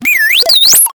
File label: science fiction robot spoken phrase; r2d2 style